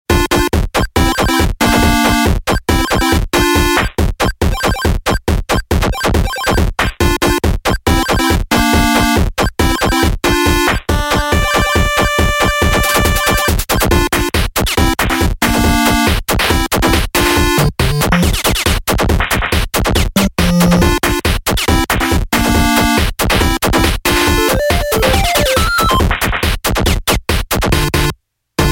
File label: 8Bits